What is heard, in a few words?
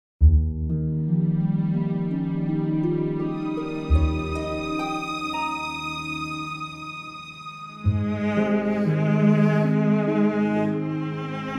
cello, Ab